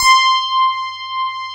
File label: FM ELP C5 L, Keyboards Old School; USB Soundscan vol.09; AKAI; FM ELP 6